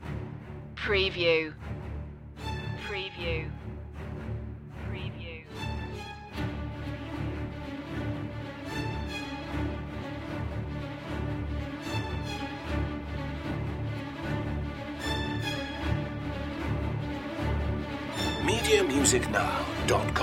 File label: war of attrition strings only